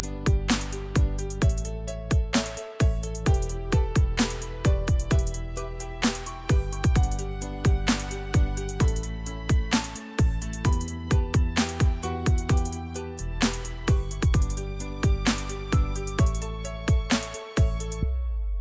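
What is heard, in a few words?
Game Over background music; Ludo-3D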